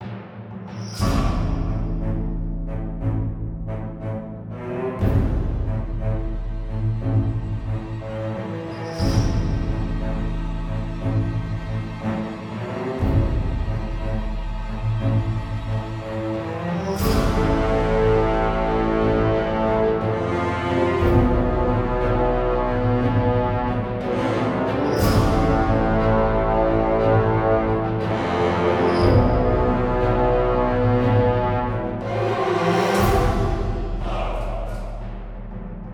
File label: Starfighter Music; Galatic Starfighter